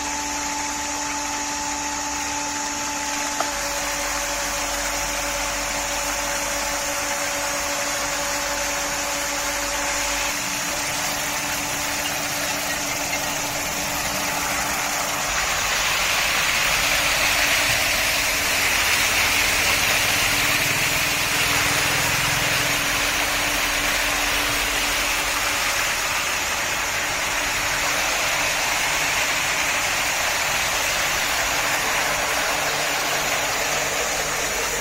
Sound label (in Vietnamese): tieng may hut nuoc va tieng nuoc